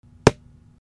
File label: Book Sounds Book Slap